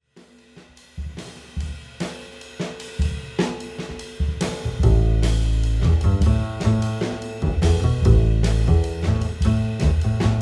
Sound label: keys